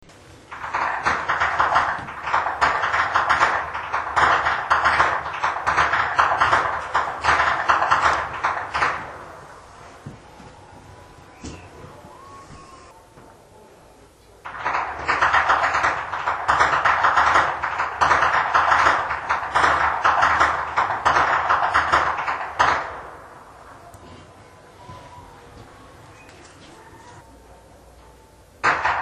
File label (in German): schnalzen, flachgau; liefering